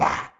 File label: hurt; FlyForInsanity